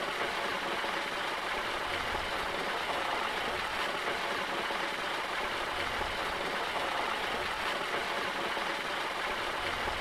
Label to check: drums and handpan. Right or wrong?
Wrong